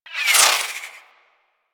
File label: Warfan Projectiles Whizzby; Warframe; Tenno; Broken Frame